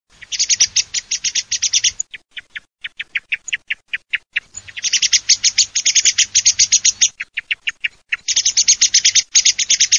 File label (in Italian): schiamazzo